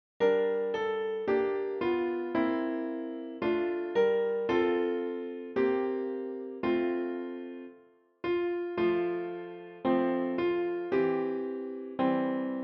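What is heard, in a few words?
O come all ye faithful, Klavier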